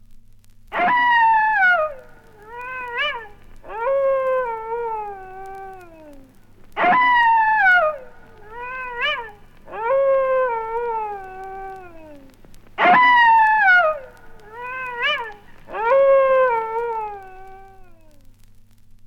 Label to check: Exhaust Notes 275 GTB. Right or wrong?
Wrong